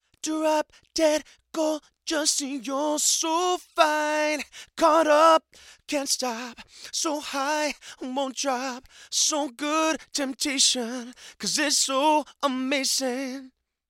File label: male vocals; lewitt